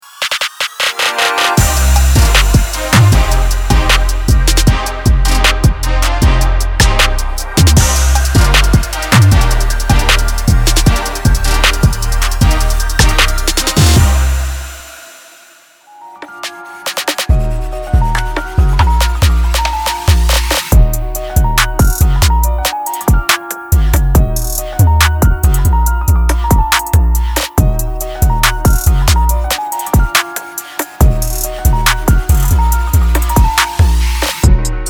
ultimate 808s; logic pro

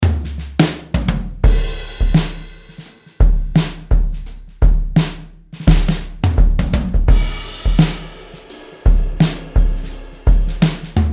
pawn shop, drum kit designer; logic pro

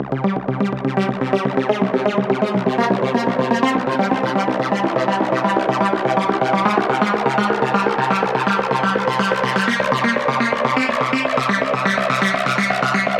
edm, vintage synths; logic pro

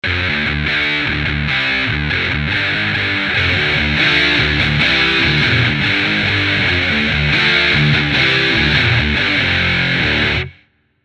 vintage british stack, amp designer; logic pro

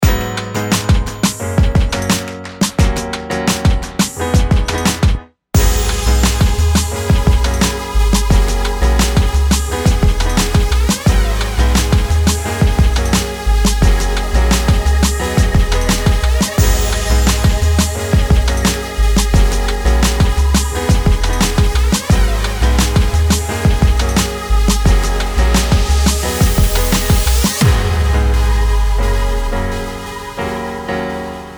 modern, loops; logic pro

